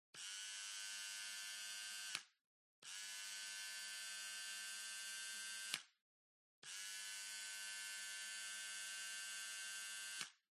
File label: household bathroom grooming nose trimmer on off buzz